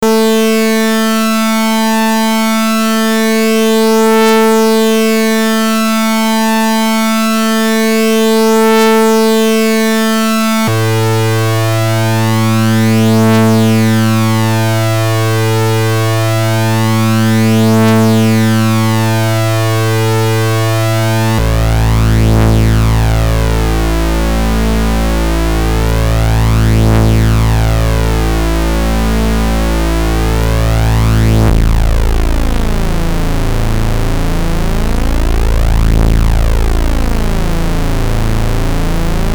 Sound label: trisin LFO